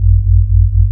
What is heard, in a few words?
The Main Reactor Loop, Sci Fi